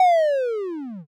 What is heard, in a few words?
shutdown